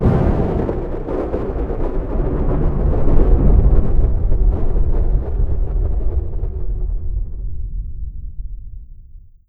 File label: thunder; fgdata